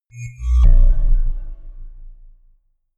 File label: notification synth drop steps; kommunikation; signale